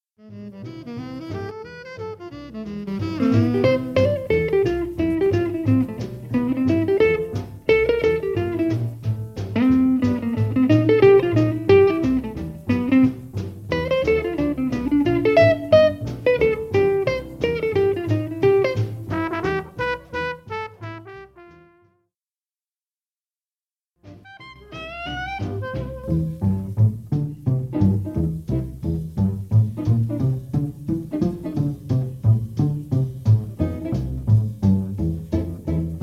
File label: solo chords